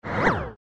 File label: Flip Window Flipped; ICQ6.5